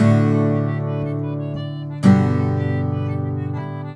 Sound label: GTR1, Celtic Flavours; USB Soundscan vol.42; AKAI